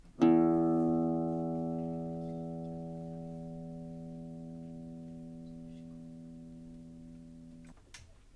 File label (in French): Gaucher mi, guitare 3/4 gaucher